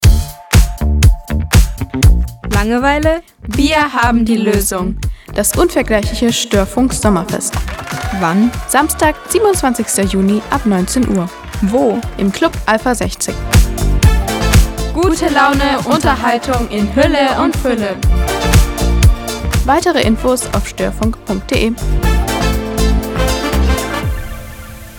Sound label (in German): jingle sommerfest; sthorfunk sommerfest 2026